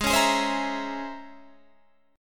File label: C sharp Minor Major 13th G sharp, guitar chord